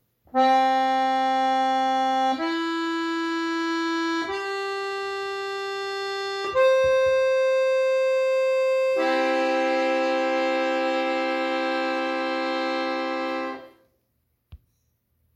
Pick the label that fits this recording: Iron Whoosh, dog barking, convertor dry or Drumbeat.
convertor dry